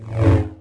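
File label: sith lightsaber Swing; weapons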